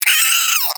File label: Cryptilex Vocal Pain; Characters; Warframe; Hunting Critters